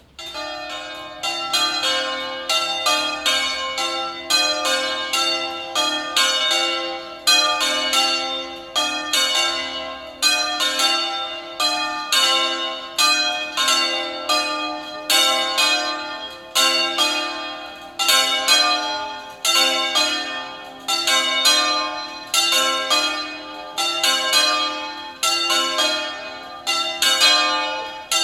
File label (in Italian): San Giovanni Del Tempio, campane